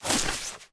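short creaky sound of jumping on an old springy bed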